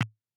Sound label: 100bpm Percussion